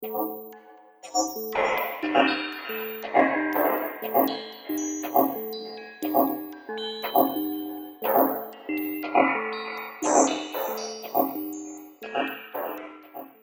Robotic Industry Atmosphere, sci-fi robot sound effect